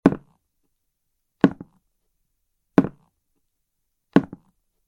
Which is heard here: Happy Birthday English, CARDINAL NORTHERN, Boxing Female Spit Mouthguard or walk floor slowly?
walk floor slowly